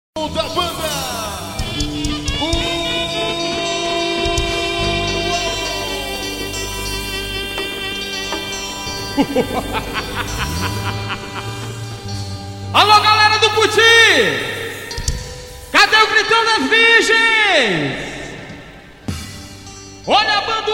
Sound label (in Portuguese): trilha